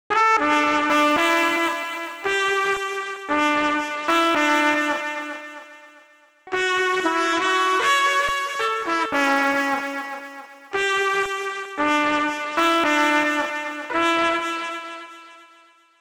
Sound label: trumpet; Pony Fan Music Remix Stems